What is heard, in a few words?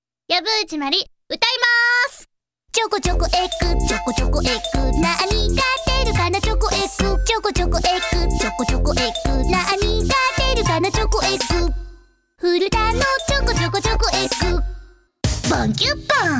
song